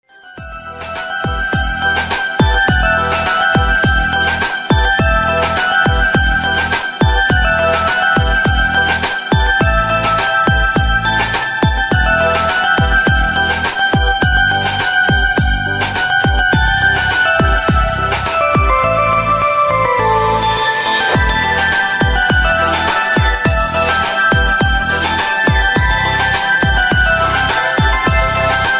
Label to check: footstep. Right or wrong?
Wrong